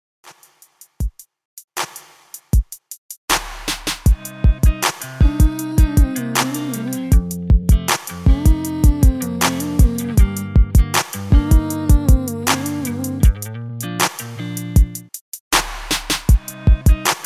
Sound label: 78 BPM; REDRUM